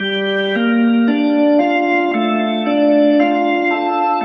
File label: unstoppable organ; Loops; Electronic